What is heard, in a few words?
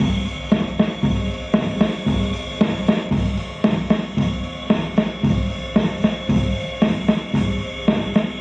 Loop, rhythmate, classic machines